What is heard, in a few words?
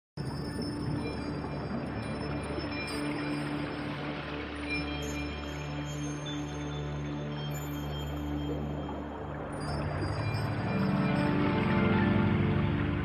Spanish Harbour